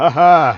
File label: haha; SM64 CO-OP; Bob's Burgers